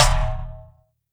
can; Roland R-70; Perc

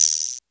rattle; Roland R-70; Perc

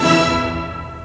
ORCH, ORCHESTRA HIT; Crypton - DrumBase5000

